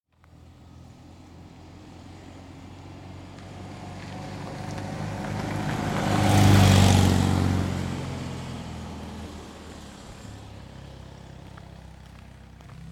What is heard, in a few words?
Fiat 126 Maluch Passing On Dirt Road